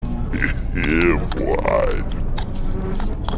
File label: deep hehe